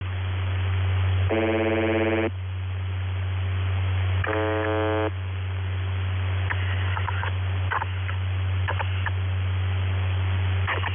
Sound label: Buzzer